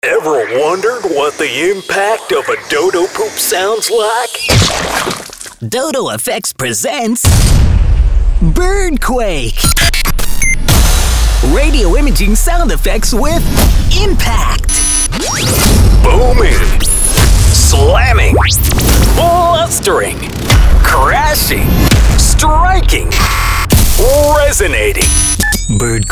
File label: Birdquake